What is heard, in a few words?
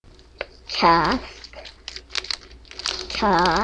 cask